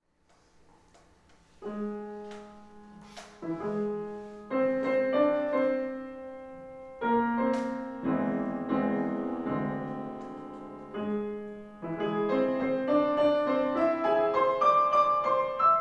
Nocturne et Allegro Scherzando

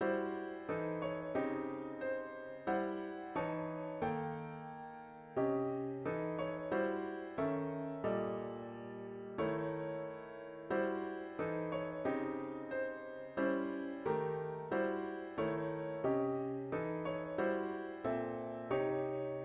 Harm altered octatonic

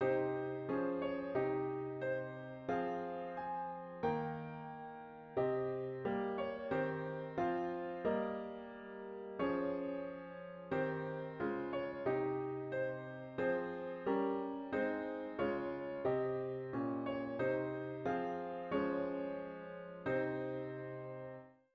Harm altered phrygian

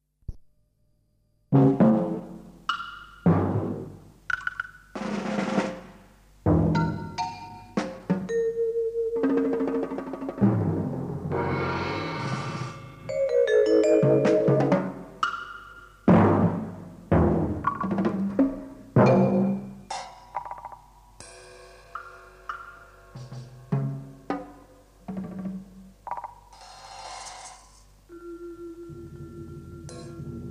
musc for perc

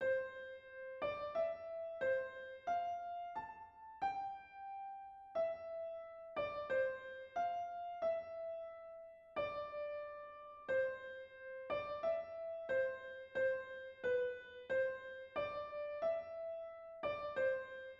Melody Major

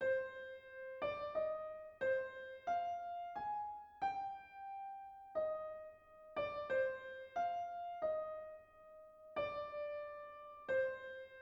Melody Minor

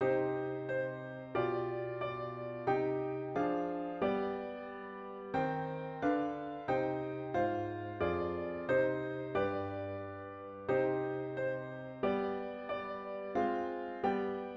Harm fixed minor

